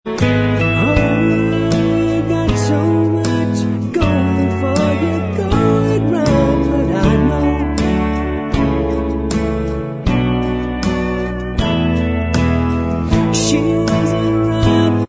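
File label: backing vocals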